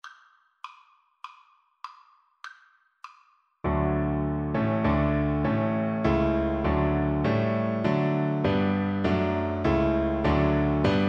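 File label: German national anthem violin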